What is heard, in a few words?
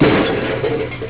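metal crash, CANS; EFFECTS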